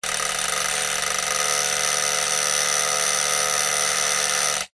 foley glass electric motor vibrate against glass rattle